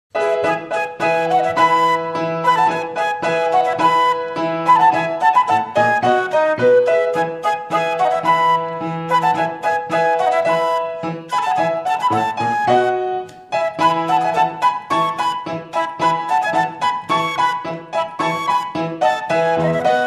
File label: piano flute spring